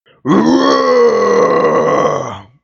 mon cri

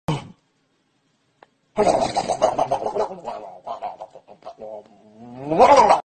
chevre

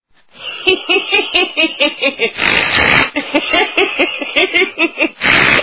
rire

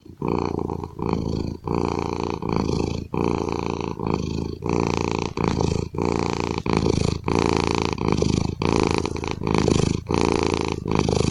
ronronnement de chacha

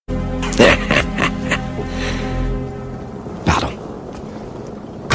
rire; christophe lambert

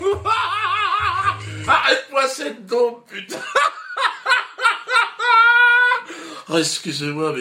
rire; dieudonne

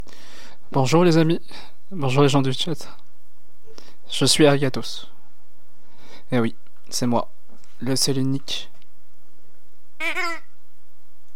voix snif

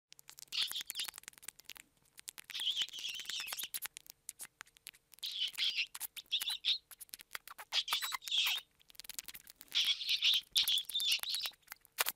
souris cris

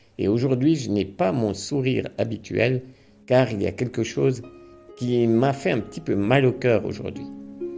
sourire

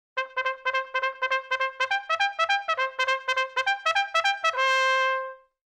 trompette

